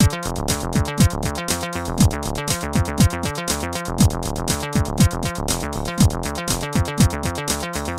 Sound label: Clockwork Machines - Standard